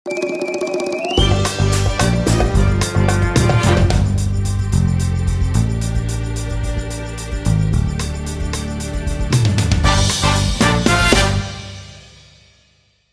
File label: China music cue